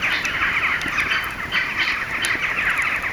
Campfire Birds; outdoor; Camping

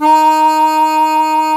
HARMONICA

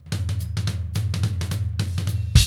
FILL, Funky Ass Loops

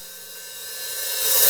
REV Op HiHat, Roland JV-2080

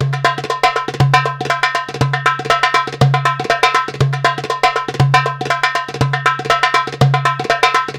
PERLP, ArabianTraditions; USB Soundscan vol.43; AKAI

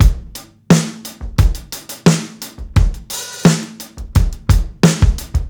16TH GROOVE, drums; BASEMENT DRUMS VOL 2

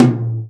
tom, Real Drum Kit, jungle kit

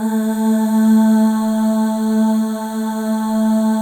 Voices, FEM AHS; Spectrasonics; FEM.2 AHS

